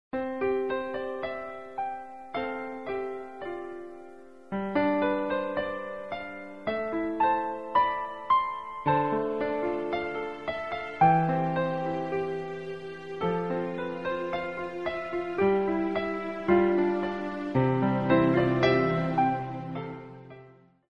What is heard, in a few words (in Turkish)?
santral fon muzikleri